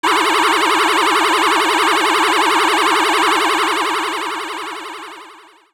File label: Mirror Shield Reflect Ice; The Mirror Shield reflecting Twinrova's ice blasts (edited to fade out)